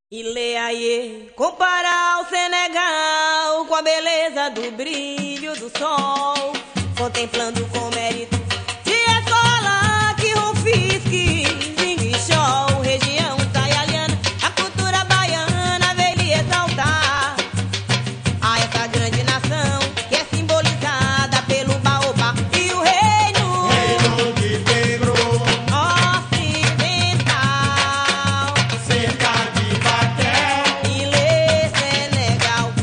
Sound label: black chant; canto negro